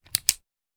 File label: Hand Gun Foley; Sound Ideas; Guns